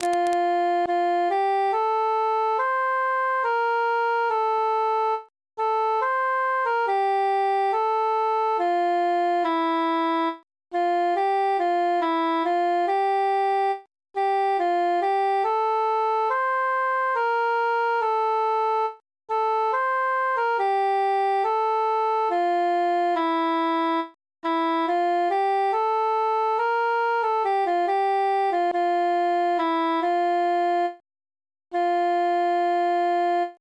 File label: cantus solo; innsbruck